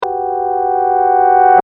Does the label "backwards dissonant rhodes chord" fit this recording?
Yes